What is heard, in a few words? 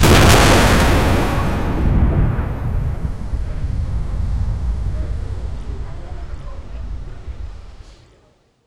a very short screen from, kaboom